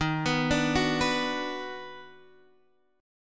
Eb Minor Major 7th Sharp 5th, down, Guitar, Half Step Down